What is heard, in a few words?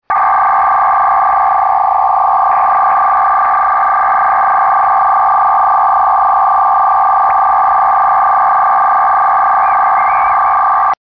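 arq e3 idle, digital modes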